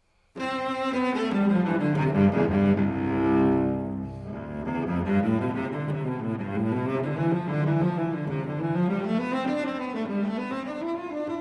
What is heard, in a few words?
Unaccompanied Cello Suite No. 3 in C Major Prelude; BWV 1009